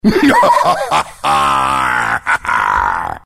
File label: laugh; freak fortress 2; gangplank